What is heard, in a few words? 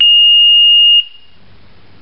intermittent, fire alarm; garrysmod